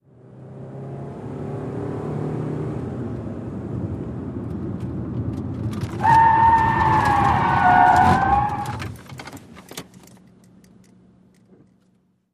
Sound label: Sedan 180 Skid Mix